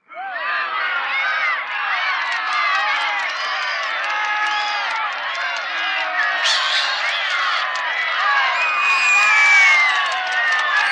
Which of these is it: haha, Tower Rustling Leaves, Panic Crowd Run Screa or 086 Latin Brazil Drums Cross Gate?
Panic Crowd Run Screa